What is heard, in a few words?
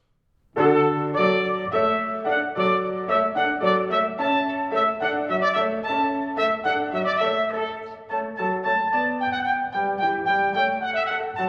Trumpet Piano